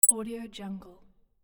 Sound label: push